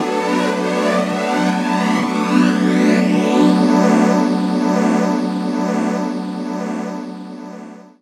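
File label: Pad Synth; Equipped Music; Loungin House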